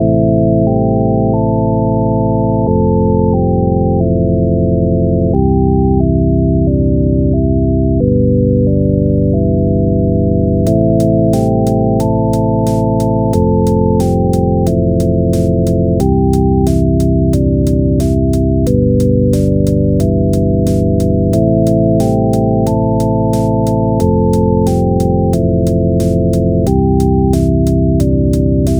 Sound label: first game bgm